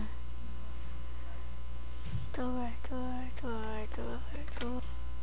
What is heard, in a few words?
door